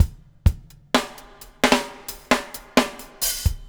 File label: bubble; Spectrasonics - Backbeat